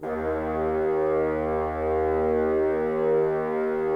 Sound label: Bassoons D#2; Miroslav Vitous - Woodwind Brass Ensemble